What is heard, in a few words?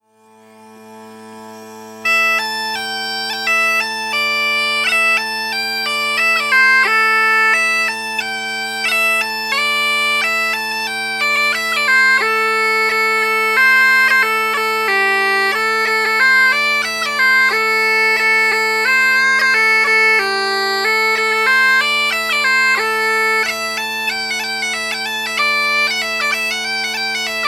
Cluck Old Hen